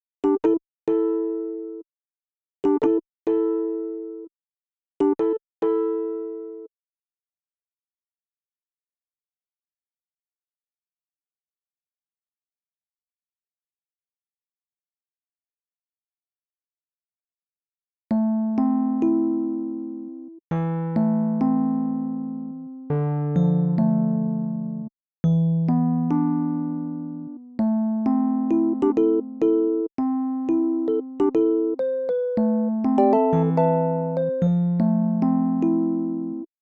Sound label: macfunk